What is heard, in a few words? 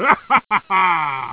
laugh; mario kart super circuit gba; Wario